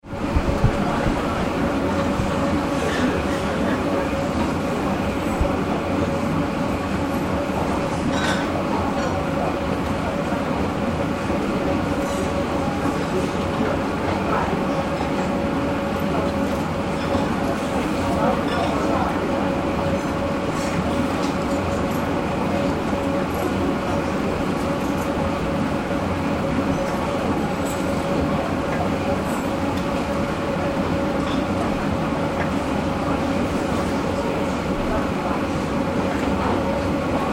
Escalator in Department Store with Background voices